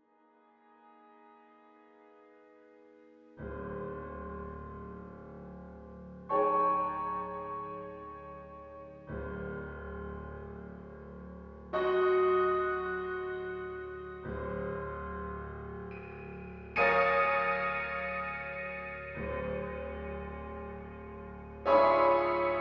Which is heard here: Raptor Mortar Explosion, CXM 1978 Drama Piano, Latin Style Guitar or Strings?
CXM 1978 Drama Piano